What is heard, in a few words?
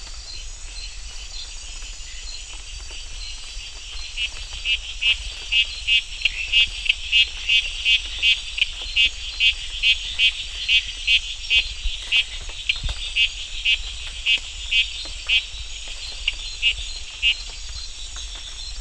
Scinax sp